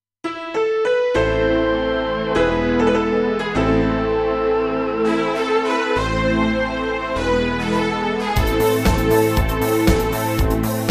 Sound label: classical